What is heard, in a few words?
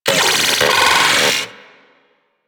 Vocal Pain; Warframe; Corpus; Arachnoid Wraith